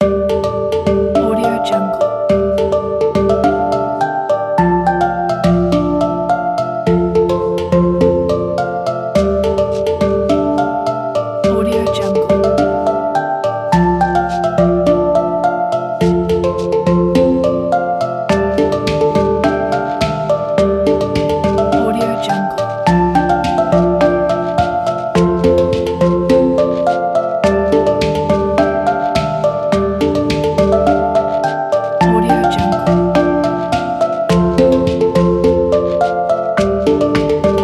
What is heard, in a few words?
relax travel percussion